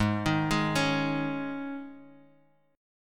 G sharp Suspended 4th; arpeggiated; 16,18,18,18

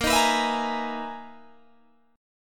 B Major 13th A sharp, guitar

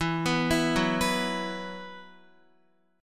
E Suspended 2nd E, Guitar, 4E,4A,5D,5G,5C,6F; Esus2; arpeggiated; x,7,9,9,6,6

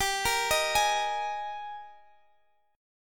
G sharp Major 7th Suspended 2nd

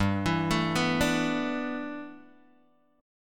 G 6th Add 9th, Guitar, Open G

